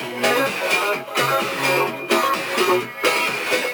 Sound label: Special Sounds Root C# 128 BPM; Vengeance Samples; VEH3 Special Sounds